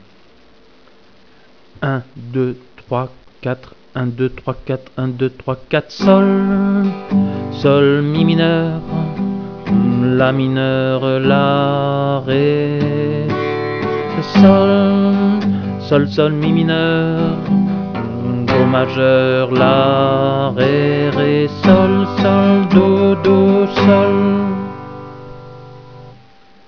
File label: SOL, guitare